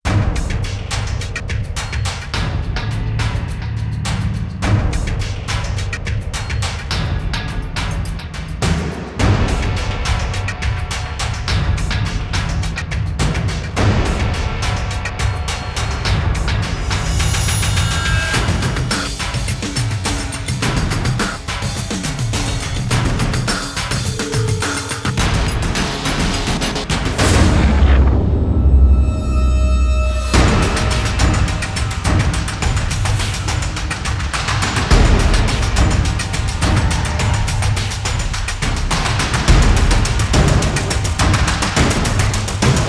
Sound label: drums fx